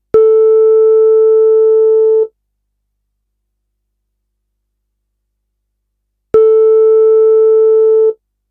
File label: Tuning note